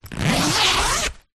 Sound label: tent zipper door compartment; foley; zapsplat